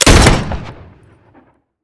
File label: shoot